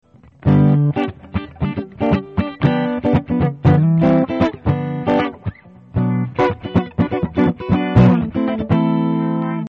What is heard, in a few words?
both clean, pickups; Pickups beide, CLEAN; johnson